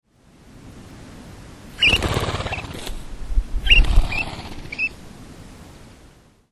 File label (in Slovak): prepelica japonska